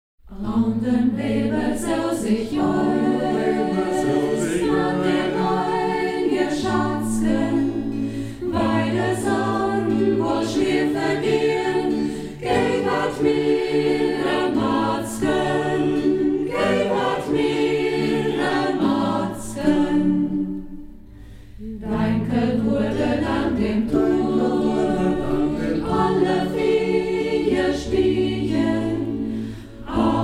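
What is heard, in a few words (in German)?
Gitarrenkränzchen; Zeidner; Ortsmundart: Zeiden; Angderm Lirber såß ech iest; Hegt wird gesangen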